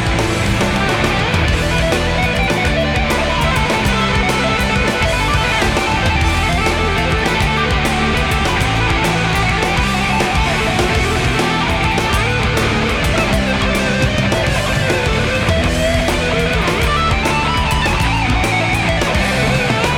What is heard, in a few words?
solo